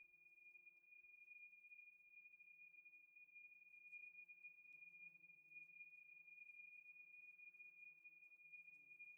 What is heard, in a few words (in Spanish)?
abejas; basel; choir of species